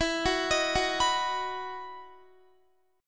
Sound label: E Major 7th Suspended 2nd, Guitar; EM7sus2 arpeggiated